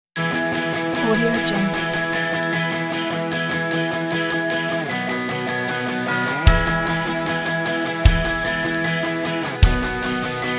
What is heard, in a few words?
xmas rock jingles bells